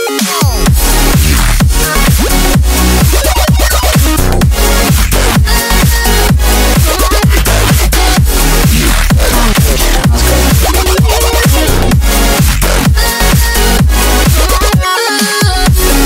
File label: samsung galaxy s6; cuc doc